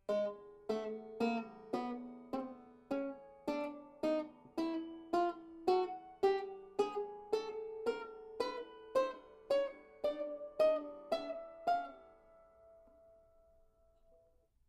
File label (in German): tapping dritte saite, banjo